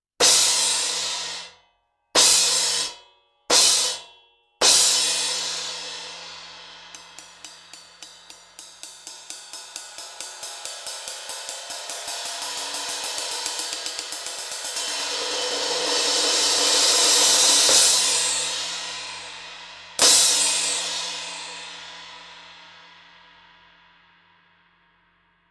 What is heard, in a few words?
Mist 18 Inch Brilliant Power Crash